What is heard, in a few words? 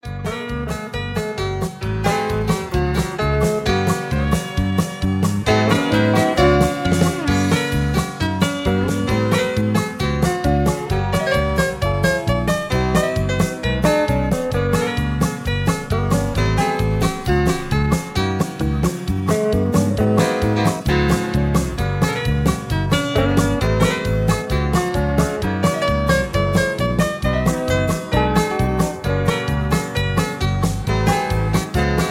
Instrumental Low Key